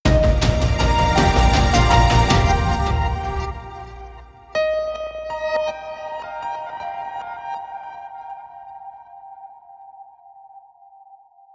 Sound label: Transition; entwined; ps vita